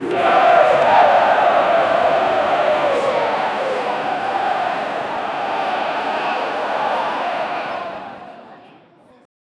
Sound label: crowd chanting fighters n, arena